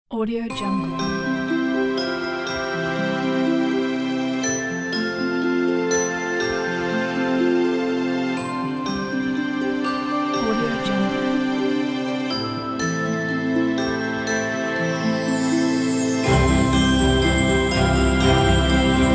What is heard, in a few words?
christmas wishes